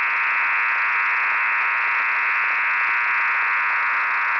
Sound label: NATO16 TONE; MIL-STD 188-110A app. A 16-tone modem; 16-TONE QPSK; without pilot tone